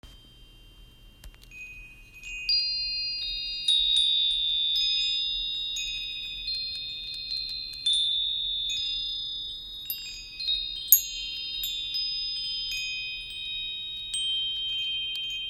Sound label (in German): Klangspiel Little Gregorian